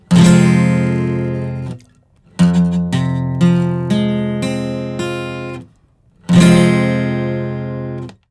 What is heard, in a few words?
Major or Minor